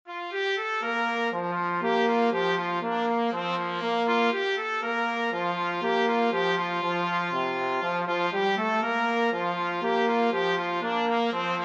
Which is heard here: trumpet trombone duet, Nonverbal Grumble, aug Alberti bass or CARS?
trumpet trombone duet